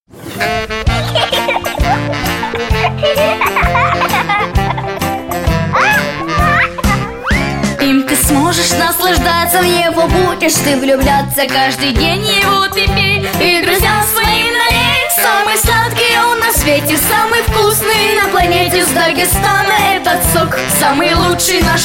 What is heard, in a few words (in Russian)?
Сок Хас (Vocal)